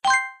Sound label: energy; Phineas And Ferb - Backyard Defense